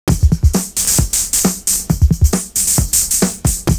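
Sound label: sub hi-hat, 133.5; Univers Sons - Kitch 70s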